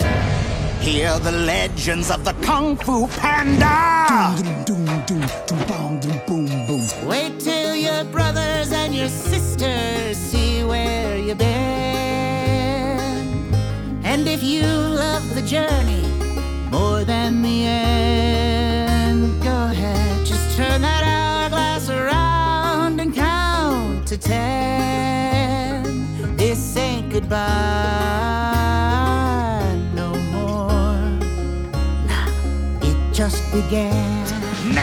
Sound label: animation men singing; voices men